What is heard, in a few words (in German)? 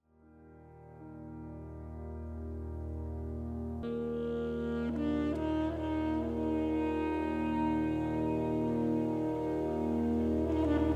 Musik Meditation Landscape